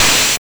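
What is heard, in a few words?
open hi-hat, trash kit; Drums Dance & Groove; USB Soundscan vol.11; AKAI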